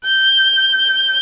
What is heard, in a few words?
violin G6; Greytsounds VOL-1